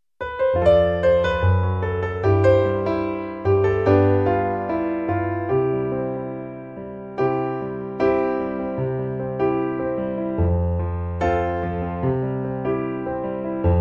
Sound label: PIANO